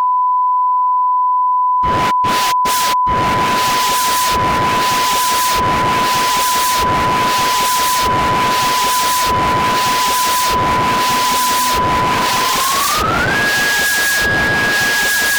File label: noise; Rimac noise 2